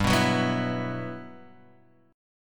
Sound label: B Suspended 4th G, chord; Bsus4/G strummed; JGuitar